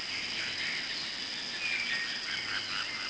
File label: jungle; Ambient; MX vs ATV Unleashed; XBOX